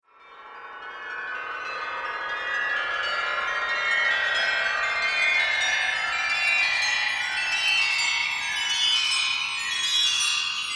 Haunted Christmas Spell, Magic Sound Effects

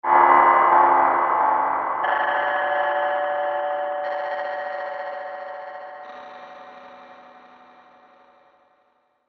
Ominous Reverb Ambience, horror; Scary Soundscape Sound Effects; sinister halloween

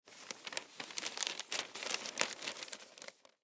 Newspaper Page, Newspaper Page Turn; Foley; Materials; Blastwave

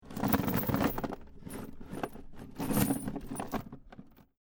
Heavy Chains Rattling On Wood; LYN104 package of Chain sounds

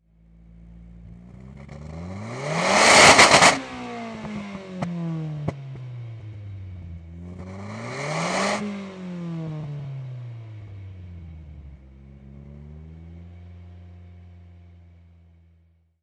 Nissan Silvia Exhaust Double Rev; Auto, Power Car, High Performance Car Sound: Nissan Silvia. Exhaust perspective. Engine revving, motor double rev

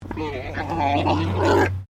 Animal Alpaca Male Mating Call

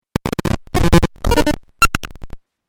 Retro Glitch; Glitch Sound Effects; sound design

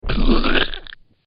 Big Muddy Splat, slimy

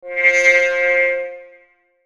Logo Warble Buzz; Warble Sound Effects; Blastwave

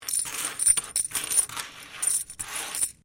Coin Penny Multiple Move Wood; Common coins; Money; Objects

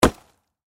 Impact Axe Chop Tree Hit Wood; Axes; Sound Effects:Tools; Blastwave

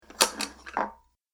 Saucers Stacked Then Placed, dishware; Household; Restaurant, Diner

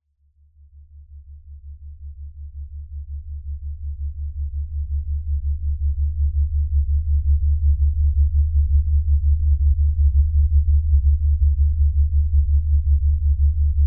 Lfe Swell Low Bass Movement; Blastwave

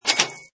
Money, Button sound; Multimedia

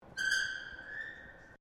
METAL IMPACT SCREECH; Metal (Sound Effects:Materials)

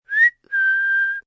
Whistle Uh Oh, Whistle, quiet; Whistling; Voice Box

